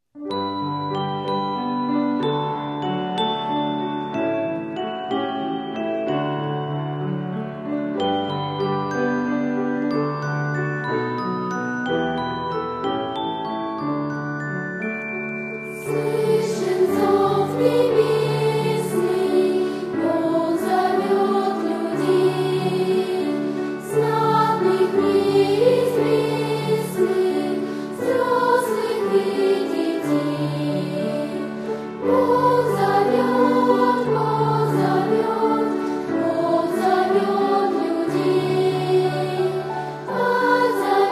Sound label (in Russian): Слышен зов небесный (пение)